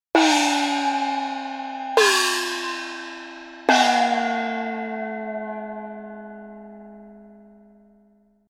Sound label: Lou